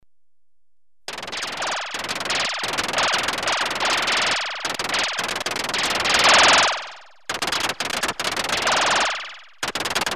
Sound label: Machine gun rhythm (rapid, prolonged bursts), Electronically Generated Sounds; Sound Effects Library